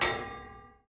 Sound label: metal, hit